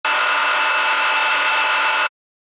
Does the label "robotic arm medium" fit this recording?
Yes